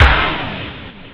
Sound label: STAB